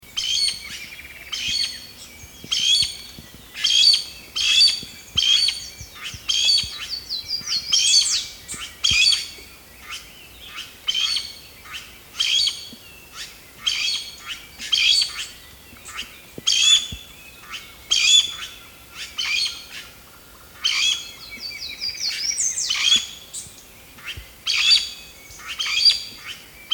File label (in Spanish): Arasari Caripardo Pteroglossus castanotis